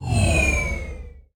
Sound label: Vehicle Move Out